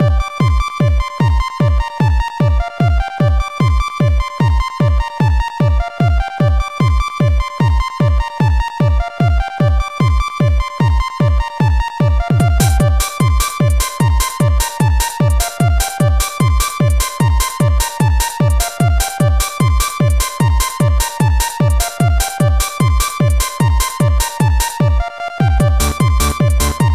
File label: KEYGEN MUSIC RINGTONE; DELTA FORCE LAND WARRIOR 3